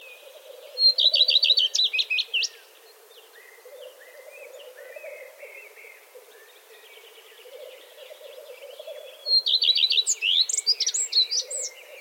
Gartenrotschwanz Gesang

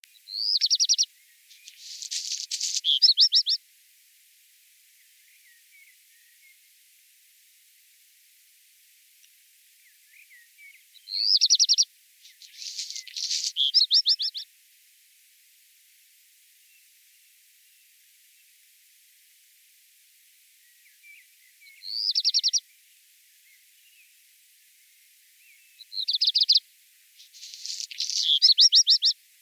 Hausrotschwanz Gesang

